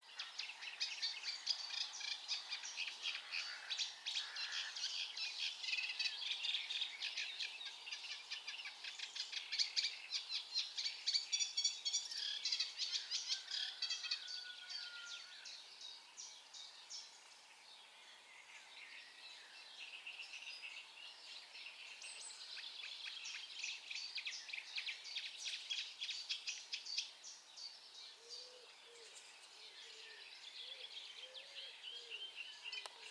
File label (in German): Teichrohrsänger, Vogeltöne